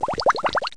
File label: bubbles; CD Action